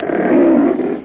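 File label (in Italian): leone, lion; Amiga 8-bit Sampled Voice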